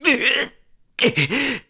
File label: Link Carry Stamina Very Low; Skyward Sword